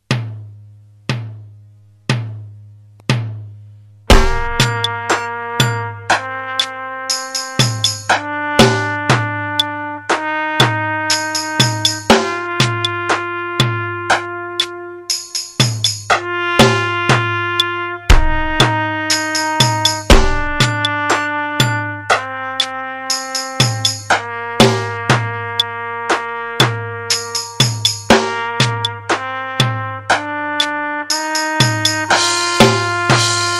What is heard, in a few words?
slow metro